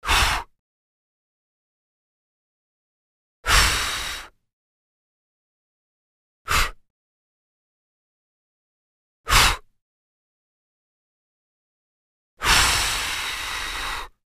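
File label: blowing out a candle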